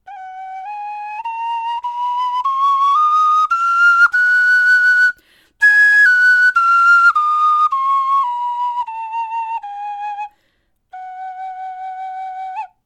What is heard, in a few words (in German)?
tonleiter